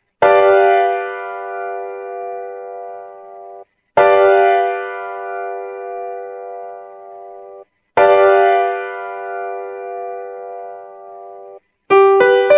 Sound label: G9 Piano Chord